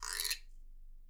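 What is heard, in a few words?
guiro, Percussion; Miroslav Vitous - Percussion & Harp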